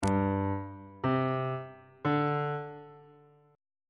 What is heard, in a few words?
f double sharp suspended 4th triad chord intervals on bass clef